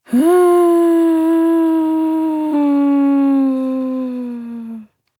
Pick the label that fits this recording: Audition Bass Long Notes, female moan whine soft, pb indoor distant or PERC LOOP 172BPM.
female moan whine soft